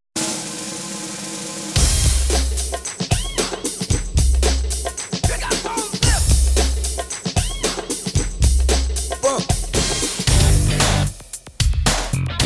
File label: The Earth Rap; KSE